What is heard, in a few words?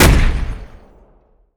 Automatic KPVT End, Weapons; DCS